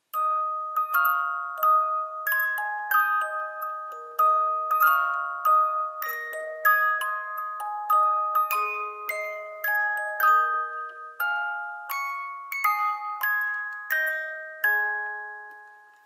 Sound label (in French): boite a musique